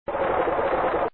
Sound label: roar; phantoon